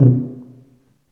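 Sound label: brass tuba C, staccato; Solo Brass; Roland LCDP12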